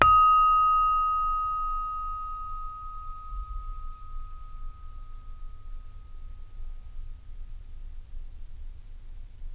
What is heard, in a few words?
SARON D#2; Spectrasonics; Heart of Asia